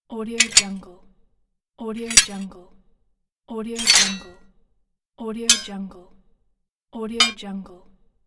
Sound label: metal clink